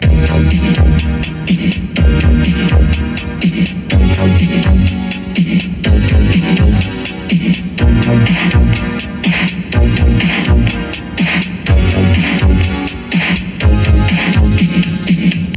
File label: Tape Rock Tape Pop